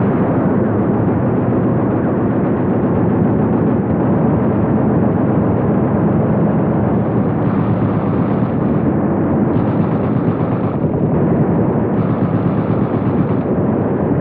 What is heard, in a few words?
rooftop loop; hl2